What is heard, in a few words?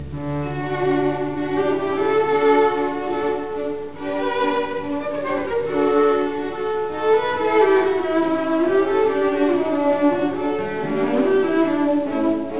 D 1st phrase, cello; Haydn